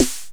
mc303 snare; Drum Hits

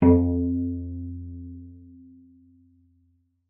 cello pizz F2; 90 s Sample CDs; Xsample VOL-5

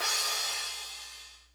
crash, Hardcore Breakbeat; Big Fish Audio; Hardcore Breakbeat Action; Robot Live

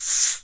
SHAKE LONG; E-MU Producer Series Vol. 5 – 3-D Audio Collection; 3D Percussives; 3D Ethnics

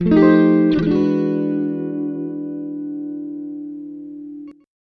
Blues, Electric & Acoustic Guitar Loops

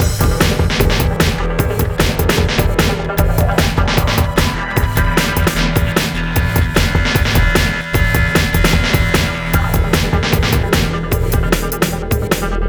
Rhythms 151 Ebmin, Hardcore Breakbeat; Big Fish Audio; Rhythms 4 Life

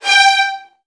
G3, Strings, VN 16TH; ILIO; Kirk Hunter

